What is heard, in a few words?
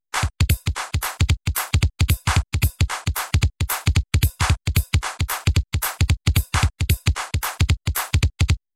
drum pattern